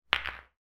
sport snooker pool ball into pocket onto other balls